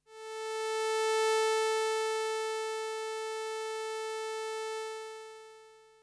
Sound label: Attack Decay Sustain Release; virusc